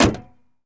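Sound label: Truck Cherokee Hood Close; SFX Kit; Trucks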